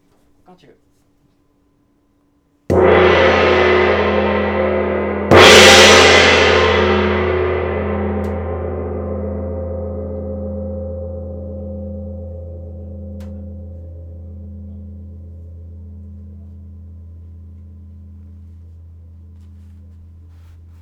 Petit, GONG VIBRATION

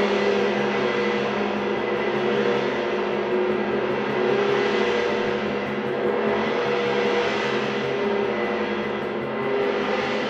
regime permanent, GONG VIBRATION

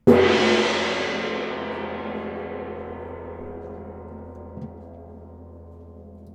regime transitoire, GONG VIBRATION

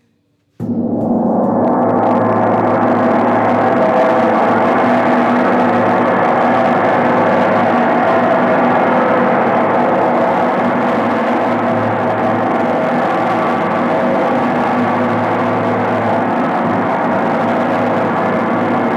Grandgong, GONG VIBRATION; 2eme essaie repeter

